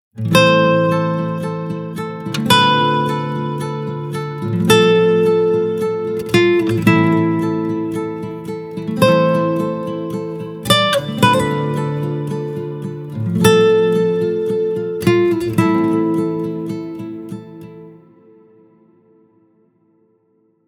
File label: 110bpm Nylon Guitar Loop; Full (Mixed); هنر صدا; Overture